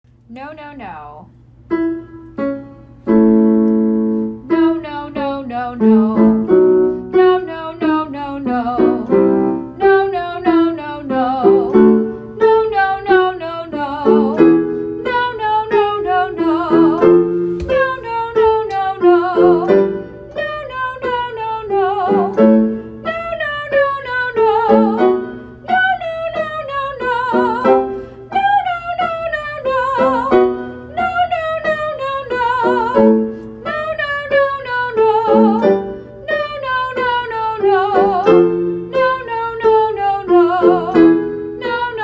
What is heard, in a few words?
WITH VOCALS, vocal warmups